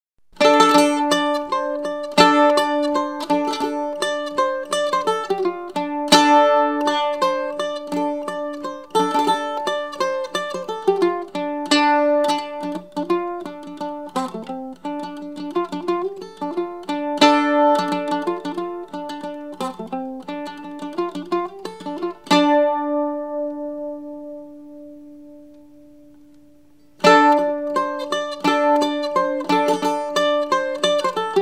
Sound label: Appalachan Mandolin